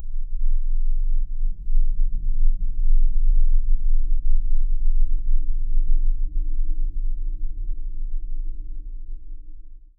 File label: horror